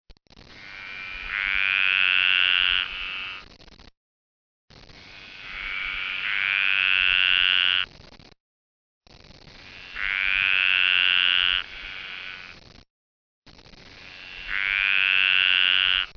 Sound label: dainty green tree frog